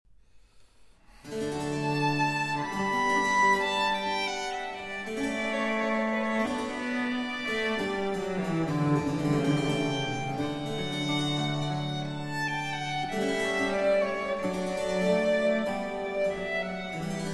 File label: Sonata For 2 Violins & Continuo in B Minor III. Adagio